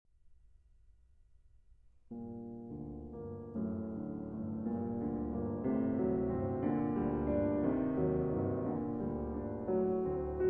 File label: Piano Trio in G Minor, Pas Trop Lent. Animé